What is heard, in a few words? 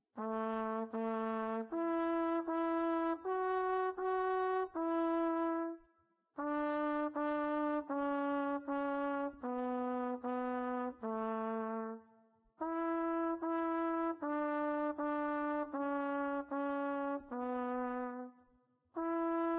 Trumpet; Zomboid; Lifestyle